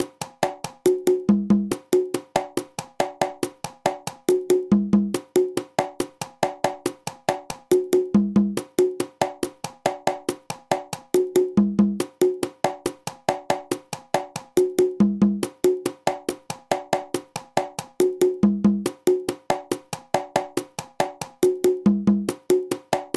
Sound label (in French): songo conga lent